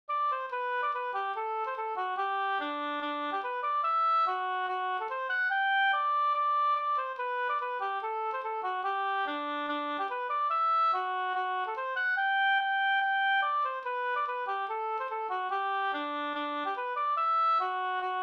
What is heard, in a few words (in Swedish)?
schottis 4-4 G dur